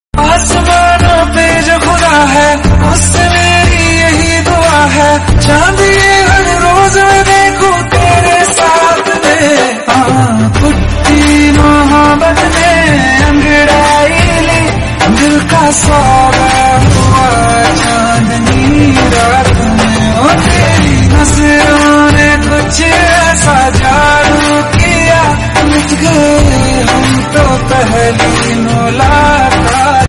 Romantic Song

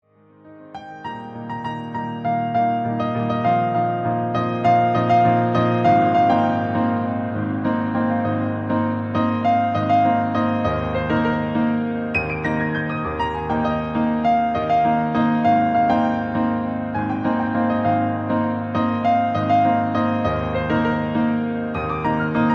India Piano

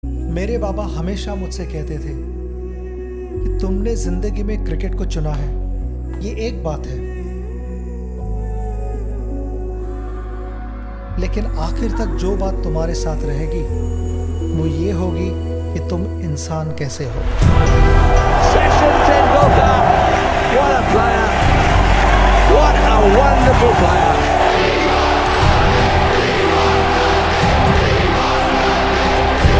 inspirational heart touch ringtone

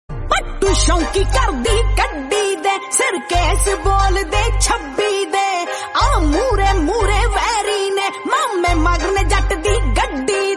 Punjabi Song

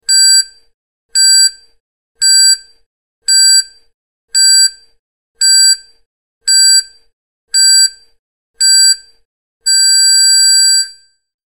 countdown timer bomb

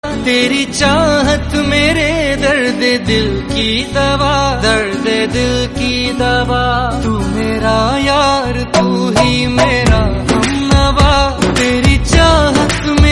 Hindi Song

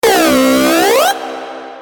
guard alarm

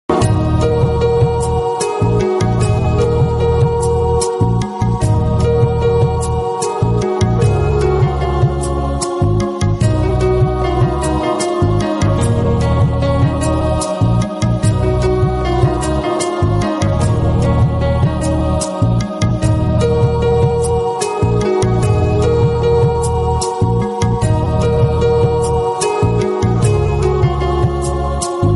Instrumental

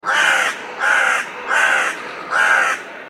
crow call

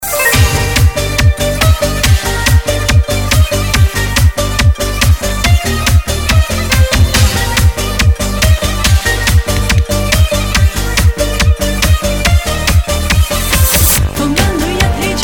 dance ghita

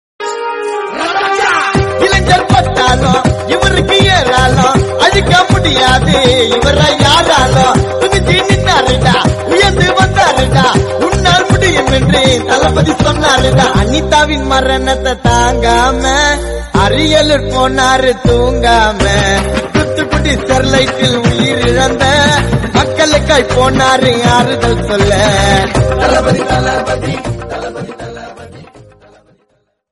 Gana Tamil

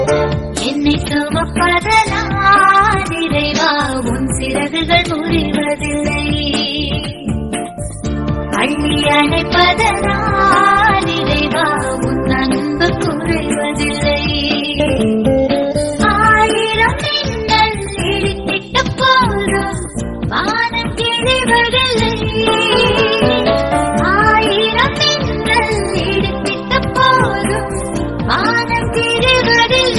song tamil

